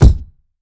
roomy kickdrum sound f key, Royality free kick drum tuned to the F note. Loudest frequency: 539Hz; kick drum samples